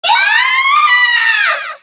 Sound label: young lady scream; soul calibur v